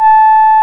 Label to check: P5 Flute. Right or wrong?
Right